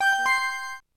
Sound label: cam start; KV920